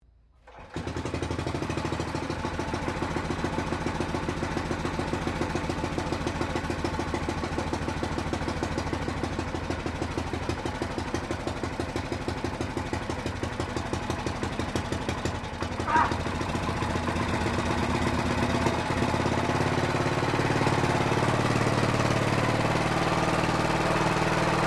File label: ROTARY HOE FULL OPERATION, simple petrol driven farm hoe; HOWARD TERRIER 1953; Machinery; Machines